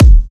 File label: Future Bass Kick E; Drums; One Shots